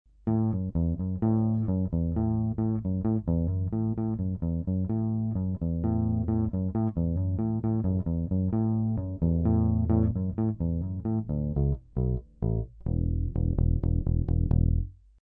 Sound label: EB3, bass